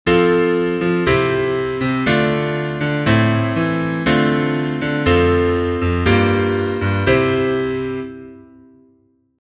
piano

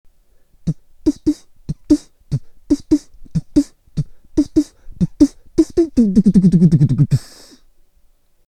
voice perc

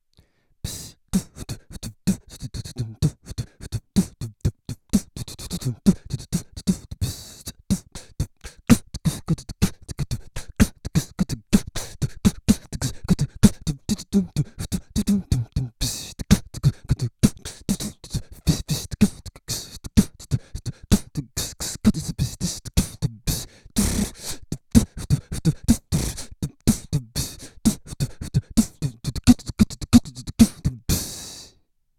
vocal drum line

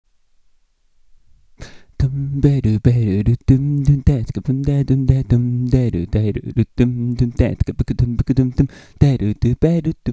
vocal bass line

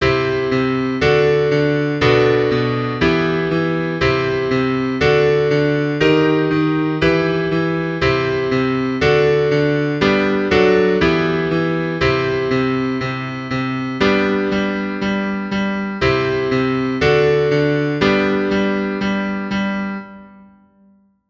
rock

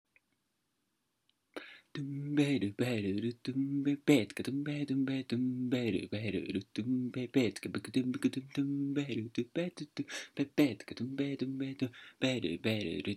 vocal bass air

